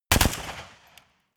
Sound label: rifle ak-47 exterior fire double light; comedy-cartoon; Pistols and guns; pistolen-gewehre